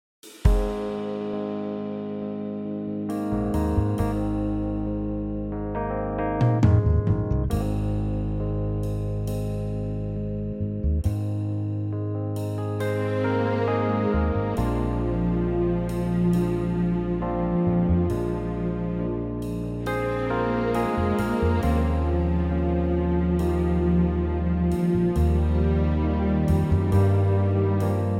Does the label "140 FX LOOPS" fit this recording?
No